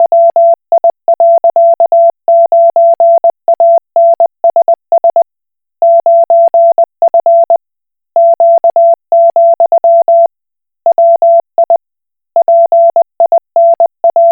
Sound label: Koch Morse